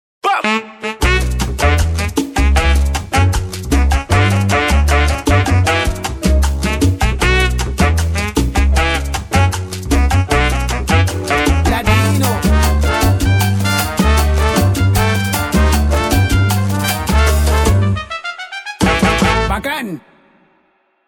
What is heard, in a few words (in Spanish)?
comercial cha cha cha